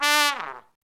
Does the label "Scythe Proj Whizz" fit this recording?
No